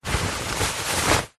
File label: foley clothes shorts swimming boardies short handling movement